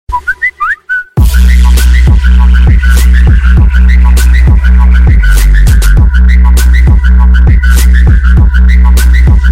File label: bass boost android trap